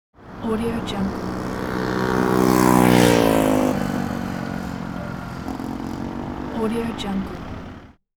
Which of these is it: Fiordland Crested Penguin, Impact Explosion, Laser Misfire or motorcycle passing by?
motorcycle passing by